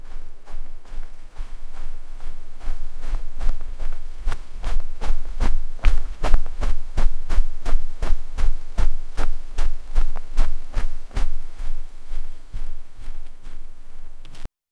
running on carpet then st, footsteps

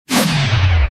missile firing sci fi; launcher

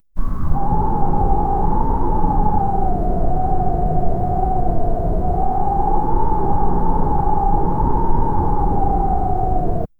a gentle anime, wind

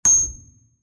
bullet shoot sound, gun

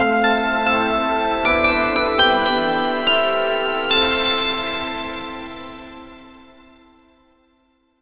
elegant refined logo reve, classy

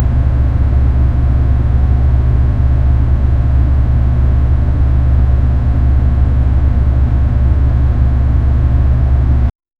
steady hum of a box, air conditioner

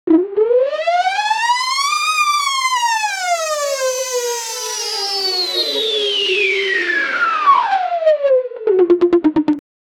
stop time dance, time manipulation

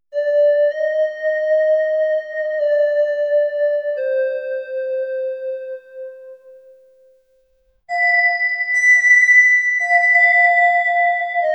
horror background music; chill

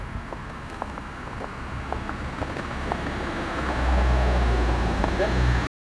sounds of the evening cit, evening

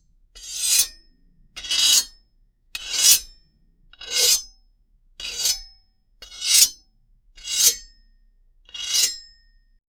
metal rod sliding

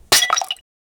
glass pot breaking

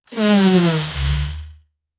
combo sound, sand

